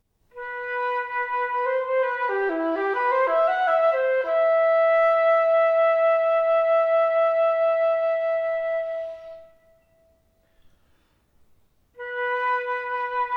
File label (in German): Partita Floete